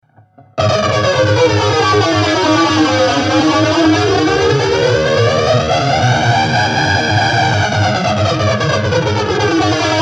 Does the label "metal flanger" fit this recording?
Yes